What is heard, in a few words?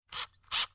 medium drill burst